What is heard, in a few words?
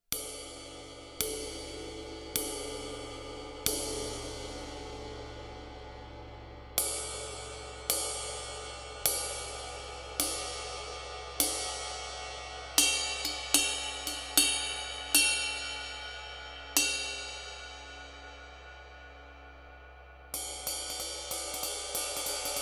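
ride SABIAN RAW BELL DRY 21, CYMBALES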